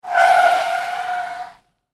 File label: Vehicle Auto Tire Squeal Brakes Automobile Car; Cars; Vehicles; SFX Bible